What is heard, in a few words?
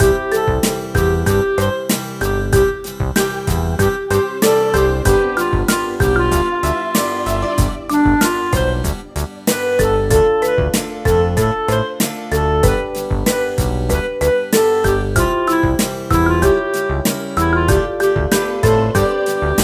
Pad; thomas to asonde oboeru kotoba to kazu to abc; 3ds gamerip 2014